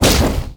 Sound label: swarm scroge missile fire; weapons; Stellaris